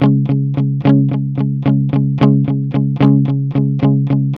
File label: ROCK, Vintage Blues Guitar; USB Soundscan vol.22; AKAI